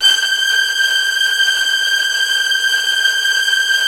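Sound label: STR TREMOLO, Virtuoso Strings, G4, left; ILIO; Kirk Hunter Virtuoso Strings VOL-3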